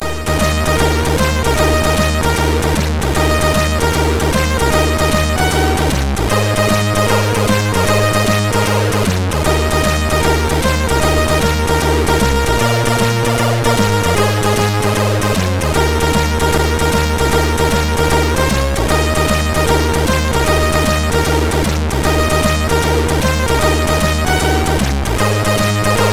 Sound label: remix, MOS6581R3; hvsc